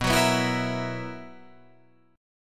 B Major 7th Suspended 4th B down Guitar Drop D

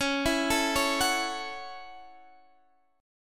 F sharp Minor 7th C sharp

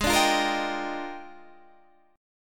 Bb 13th Ab

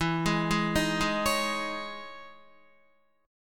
E Major 13th down Guitar; arpeggiated

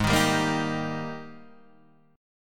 G sharp Major 7th Suspended 2nd, Guitar, Standard; 4,6,5,3,4,3; JGuitar

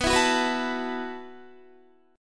B 7th Suspended 4th B down Guitar; B7sus4; strummed; 4E,4A,5D,5G,5C,6F

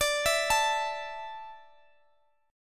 D Suspended 2nd D down Guitar Open G, chord

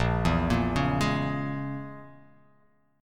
A sharp Minor 7th Flat 5th A sharp; arpeggiated; x,1,2,3,1,3,x,x

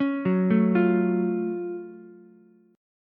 Gb Minor Major 7th Gb down Ukulele; arpeggiated; JGuitar

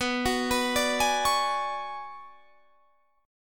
B Minor 6th Add 9th B down Guitar Standard; Bm6add9 arpeggiated; JGuitar

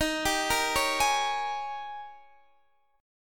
Eb 11th Eb, down, Guitar, Open D

